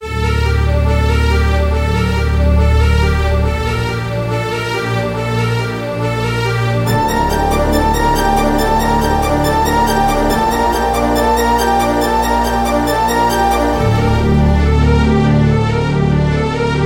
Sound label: strings